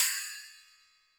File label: HiHat & Crash, Roland TD-20; HiHats